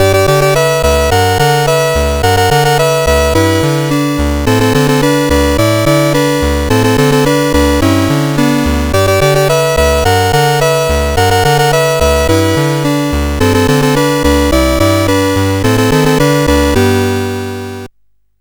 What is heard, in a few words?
Rocket Ball, CSG8580R5; GAMES